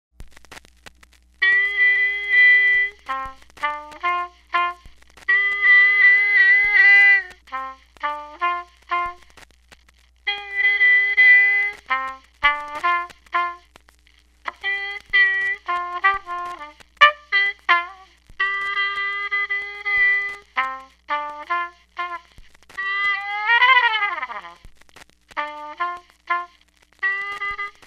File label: praise instrumental